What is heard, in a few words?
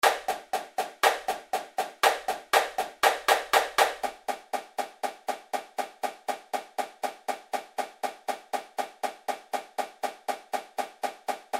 8 On A Hand 120 bpm Snare